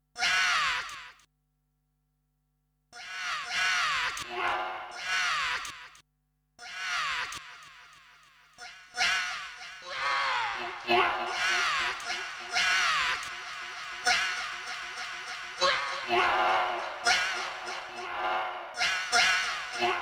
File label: short howl with delay